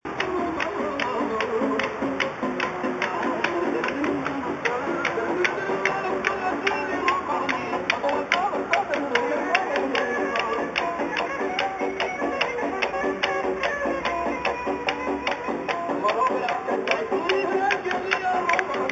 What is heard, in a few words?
street entertainment